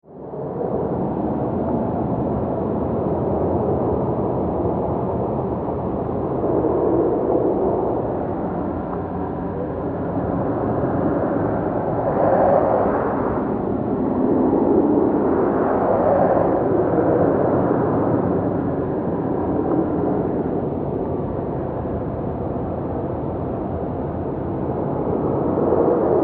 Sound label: Wind Ghostly Gusts, Horror; Wind, Ghostly, Gusts, Horror, 5.1